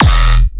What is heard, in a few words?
tearout stab E